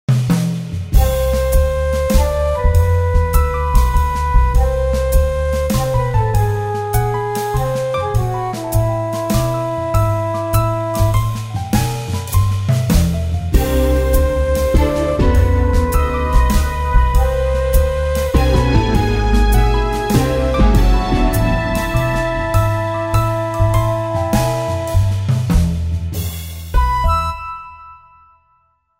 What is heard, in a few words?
Jazz